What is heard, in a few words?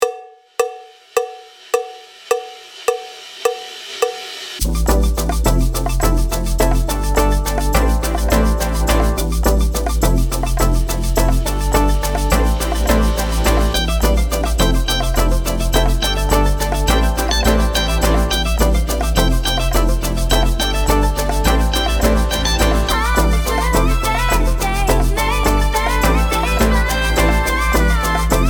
Clap Dance Song no Click; 105 bpm